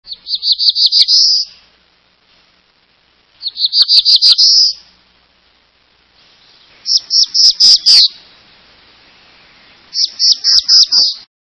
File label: Black throated Blue Warbler